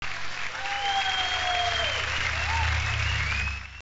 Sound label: Crowd Cheering; Vs. Super Captain Flag; arcade gamerip 1993